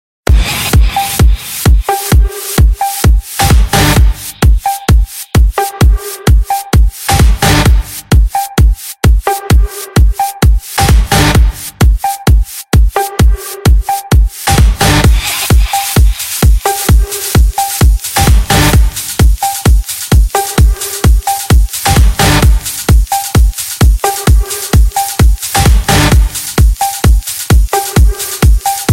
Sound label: Cute Tone